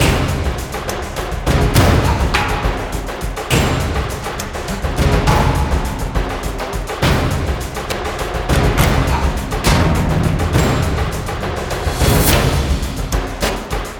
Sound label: combat loop; metrocraft; customnpcs